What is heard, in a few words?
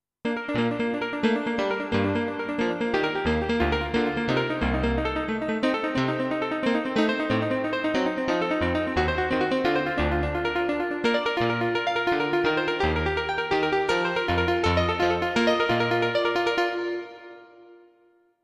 Layered CP2, Yamaha Clavinova CLP-270; I SUONI - GLI STRUMENTI XG